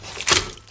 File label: trap fall; BobBlocks